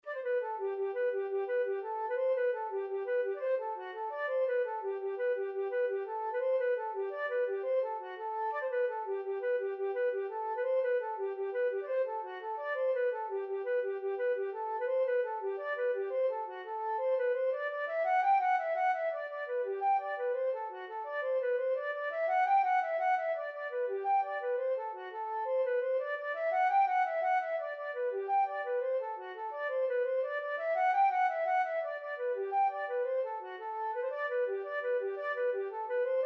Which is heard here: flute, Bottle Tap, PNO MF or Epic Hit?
flute